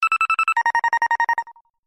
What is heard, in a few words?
Tone; LG CX265 Rumor2